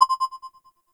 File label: Library Scanner Scan Available